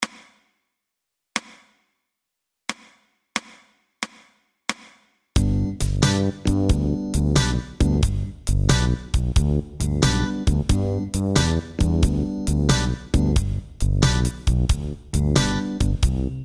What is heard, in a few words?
Chromatic Boogie